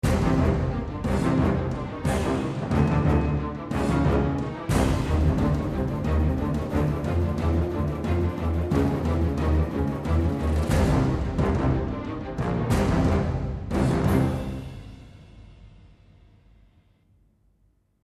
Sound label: Action